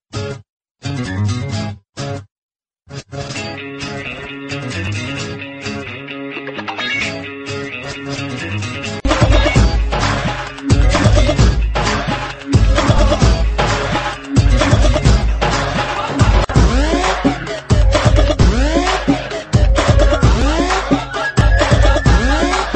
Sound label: french string; ringtone